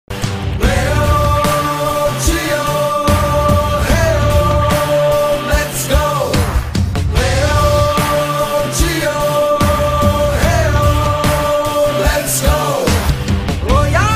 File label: Rock Mix